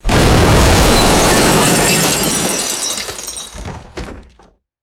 loud building collapse with crashing debris

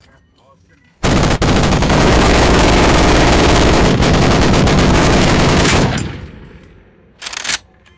overlapping heavy machine gun bursts and handling; guns

